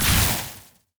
tower arcane Wizard basic Attack

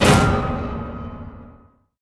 scroll Of Spaceshift cast

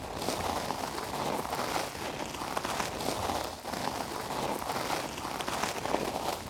tree walk loop; heroes nyru

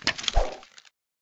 Notification Open

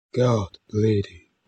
Elf bleed

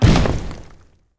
stone druid boulder explosion

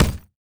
heroes command Orders death; raelyn

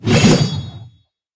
creep double sword

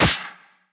heroes broden birds of prey gryphon attack

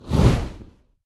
phoenix blazing offspring hit

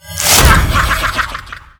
spider goddess cast spell with laughter

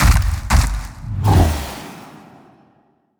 boss jump Cast; goregrind

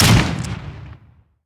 heroes basic Attack; anya

